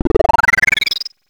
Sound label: stats up; mother2